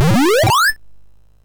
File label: sid MOS6581R2; Popeye